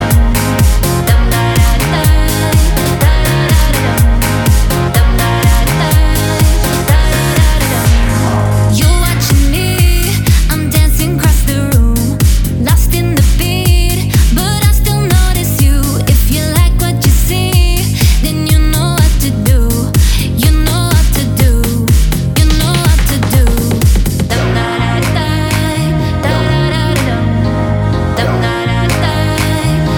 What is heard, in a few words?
Disco Driver Mix